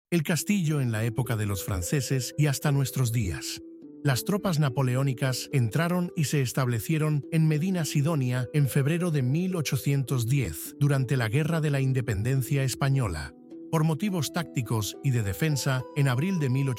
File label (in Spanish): MÚSICA